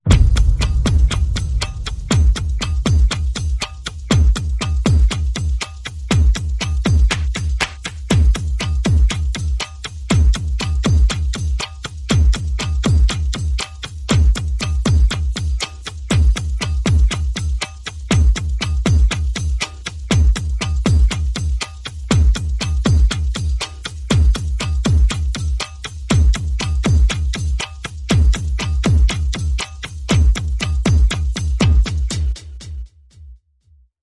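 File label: Percussion Only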